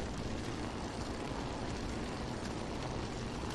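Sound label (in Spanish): RODADURA YBR, motorcycle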